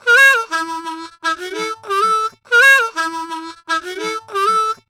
Harm 098bpm A, Harmonica

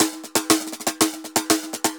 TAMBOR, DRUMS SAMBA; Best Service ProSamples vol.14; AKAI

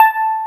RV E.PIA

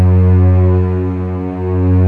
STR g, STRING; Best Service Gigapack 1

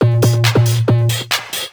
percussion loops 138; PS-45

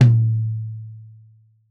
Tom2 Stereo, Deeply Sampled Drums; SMDrum

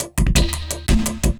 LOOP, Drum & Bass, 170 BPM

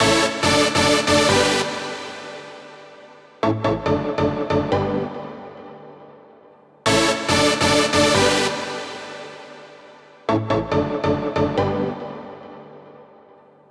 140BPM G; Vengeance - Essential Clubsounds Vol.4

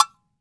AGO SQUEEZE, Brazil; AKAI S6000 CD-ROM - Volume 5

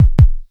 KICK, Dual Percussion Synthesiser; Klone

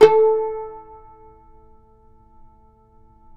CUMBUS, Guitars; Spectrasonics; Hans Zimmer Guitars VOL-2

